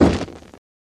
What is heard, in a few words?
Flame Burst Quick